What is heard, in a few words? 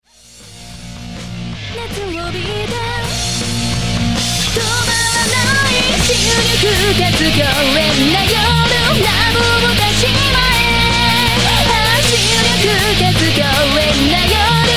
transistor amplifier